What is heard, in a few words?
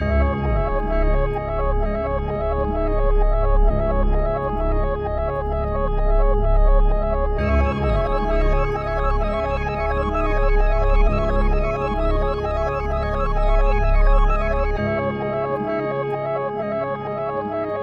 130 BPM C# Min, Melody Loops - Trap; Wick